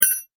impact metal small; Materials; McKinney Sound

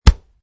Chest Punch

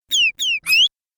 Arcade Game Bird Sounds; Video Game Sound Effects; Retro Inspired Sound Effects - Think Nintendo, Atari, C64

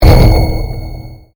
Convolution Pulse

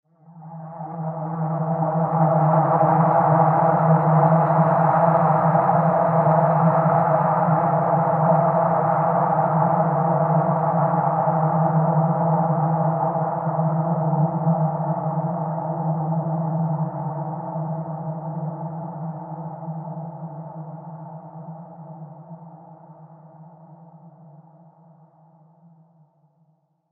The Dead Planet Ambience; Science Fiction Sound Effects; star wars / trek style games

